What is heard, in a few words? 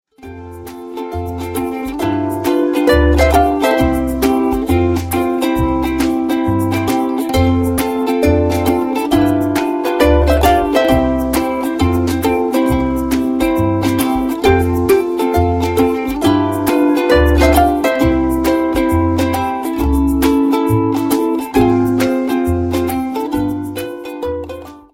music